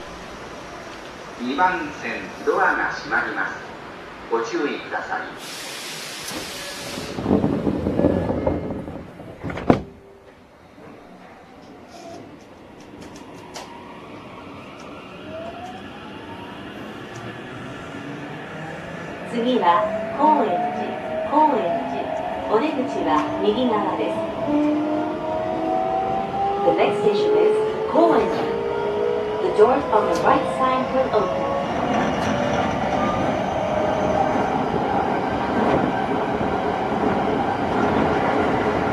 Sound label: vvvf, mitsubishi; tokyo 0541